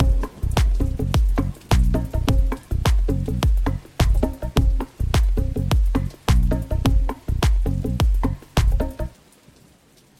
bango 6 8; bastaki

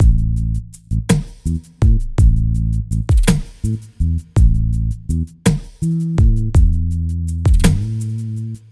Guitar Bass 6 8 Slow; bass-pack

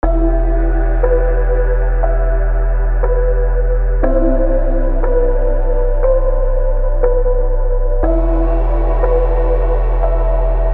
Cloud Trap & Late Night Beats; Laniakea Sounds

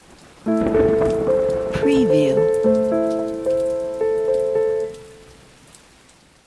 octave 3 arp piano; آرپژ 4-4 پیانو

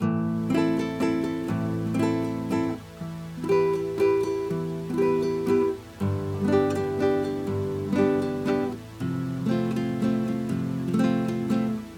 shakste guitar

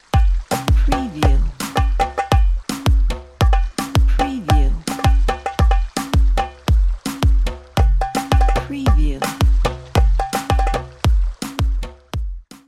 darbuka, tribal darbuka

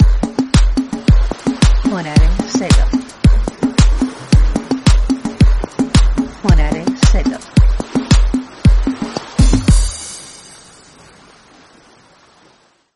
persian groove

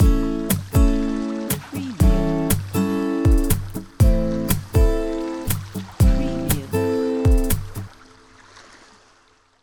2 4 acoustic

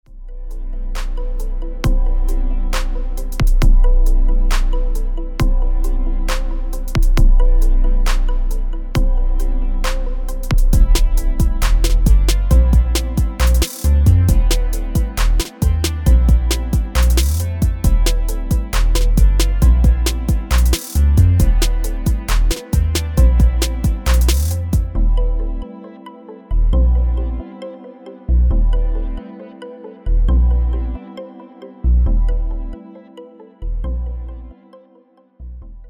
trap

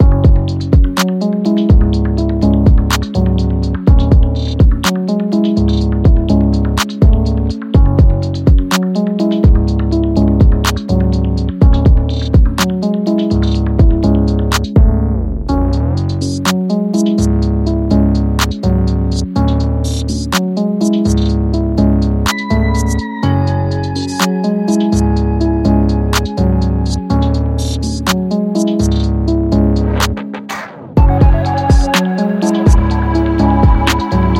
multitrack, هیپ هاپ; stems; hip hop

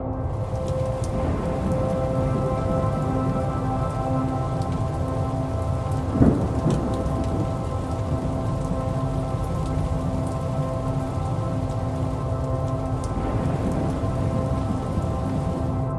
Astral, pad; ambient; ambient-series

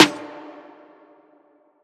Snare D#; Cymatics; Empty World Snare; Ultimate Snares

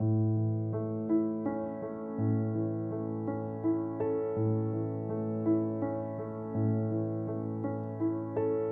piano loop 6 8 slow; piano pack

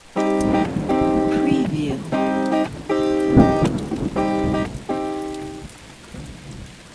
Spark no effect, Electric Guitar

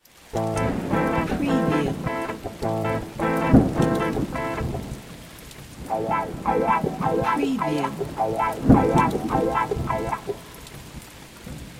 RTM Bastaki Am 105BPM, Guitar, electric; هنر صدا

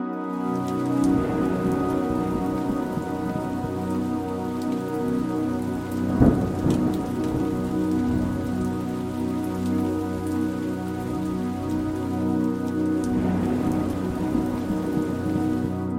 Pulse, pad; ambient; ambient-series

